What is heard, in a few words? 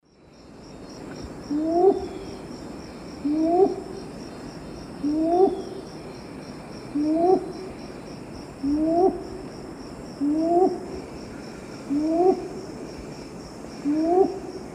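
Neomorphus pucheranii, song playback; S. America; Eastern Lowlands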